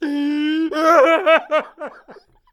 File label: Laughs